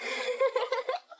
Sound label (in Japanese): 笑い（こみ上げる）